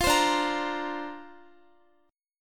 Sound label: D-Minor 7th Sharp 5th D Guitar, chord; Dm7#5 strummed; x,x,15,17,14,17